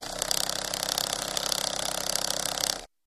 Motor, Small; Vibrating With Clicks, Slowest Speed